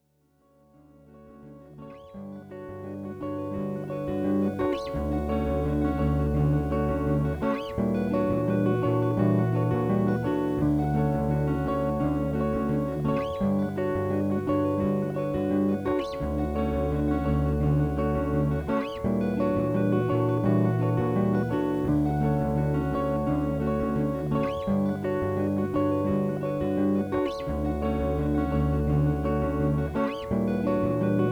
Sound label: loop